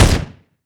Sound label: lfe pistol shot, dry; weapons; Half Life Alyx